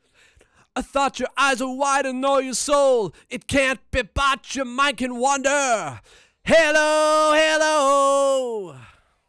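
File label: vocal; Pro Tools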